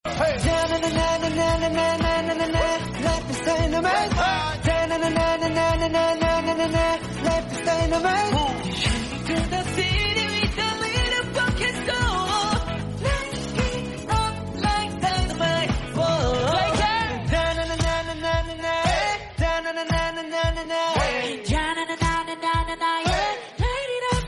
na na na refreshing background music tone ringtone; english ringtones